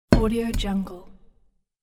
sandy stone hit move

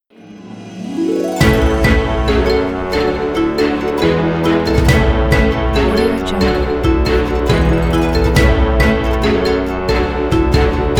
solemn ident; muzik

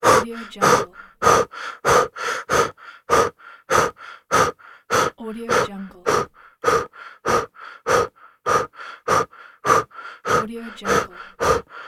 the fast breath

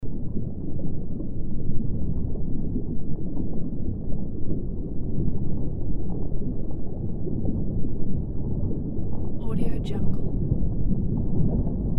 underwater

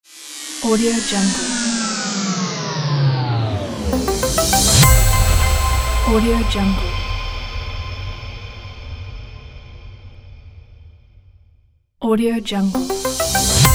technology logo

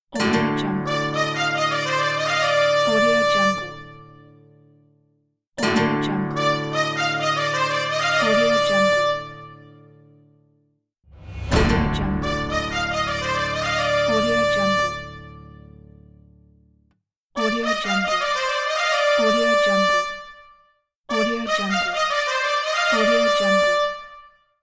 spanish ole trumpet

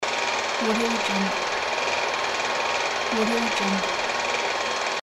retro camera

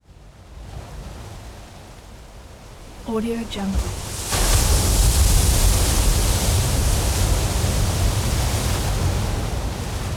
wave spraying; prosoundfx

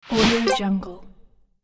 throw arcade game item

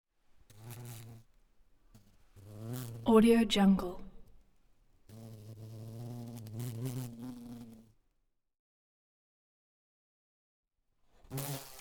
bumble bee flight wings close

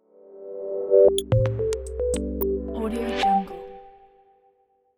elegant corporate logo

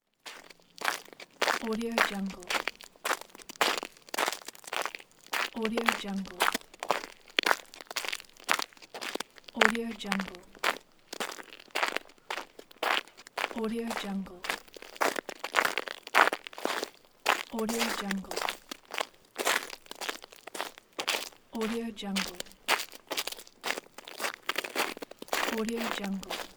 walking outside on ice

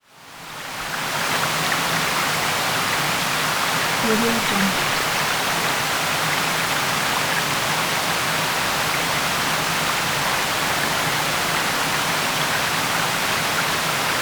waterfall; sounddivision

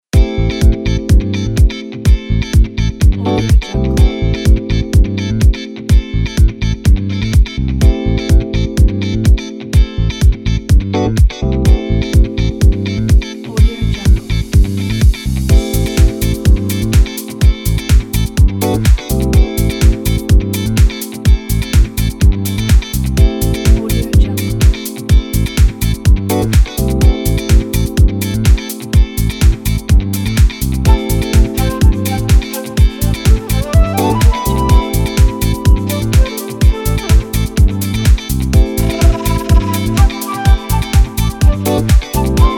fashion lounge house; poptunes

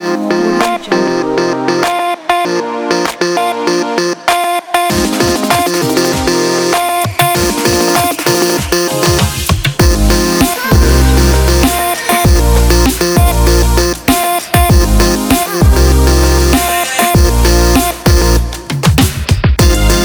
future bass urbanistic pack

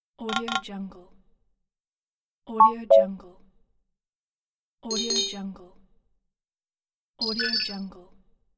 software pleasant ui; audioefx

